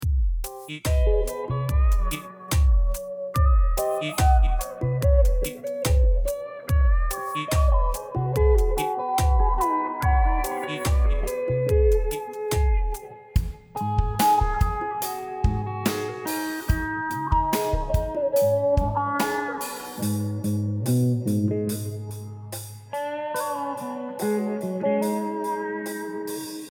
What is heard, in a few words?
slo funk cue